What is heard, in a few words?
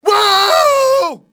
woo; Soldier Of Fortune II Double Helix; XBOX; washington